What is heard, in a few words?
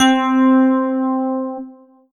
yamaha fs1r full tines c4